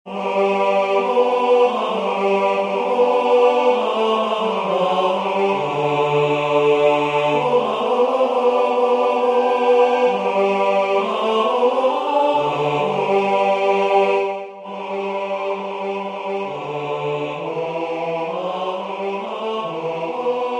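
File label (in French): basse, Musique sacrée; Cantate Domino Haendel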